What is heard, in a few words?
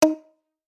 open